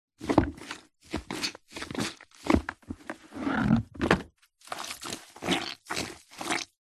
household cleaning mop in bucket little water squelch; zapsplat